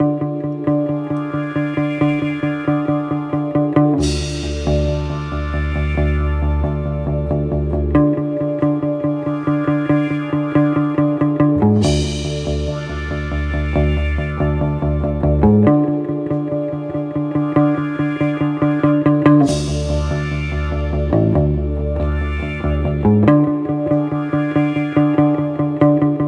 Searching Low; bully scholarship edition

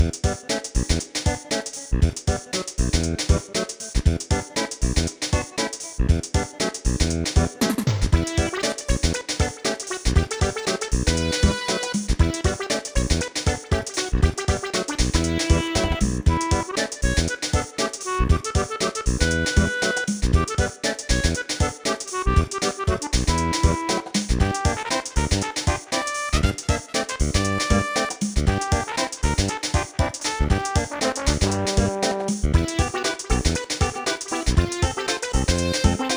Jam Jungle (Cave-Jungle Area); Kirby Squeak Squad

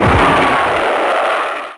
SFX - Missile Normal; Twisted Metal 2

